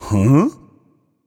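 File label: non verbal confused, voice; cernunnos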